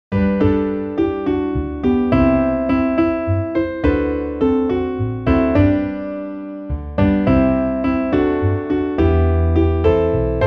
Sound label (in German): midi klavier; Heimkehr; Sei mir gegrüßt am Straßenrand